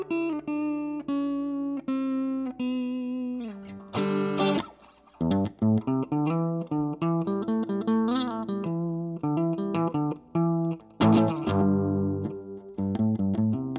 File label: Guitar; EVDS35